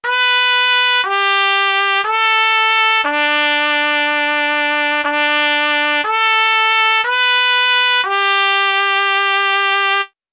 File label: trumpet